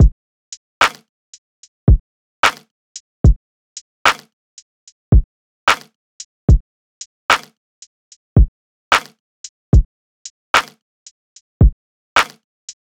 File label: Nameless Drum Loop 74 BPM, Lofi; Cymatics; ANALOG EVOLUTION Beta